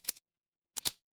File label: mechanical equip